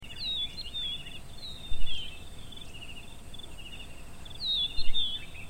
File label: rufous naped lark